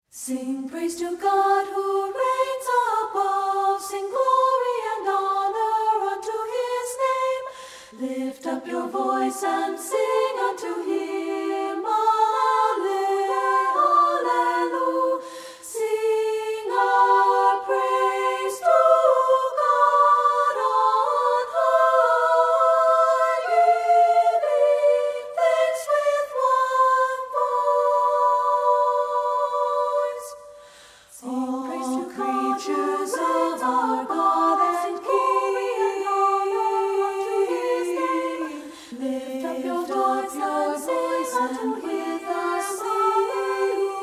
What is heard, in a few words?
VOCAL